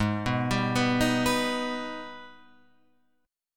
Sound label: Ab Minor Major 7th, down, Guitar, Open F; AbmM7; arpeggiated